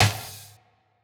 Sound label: Snare, Dubstep Snares